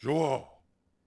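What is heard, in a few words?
Swordsman Fort Vox; Civ4 warlords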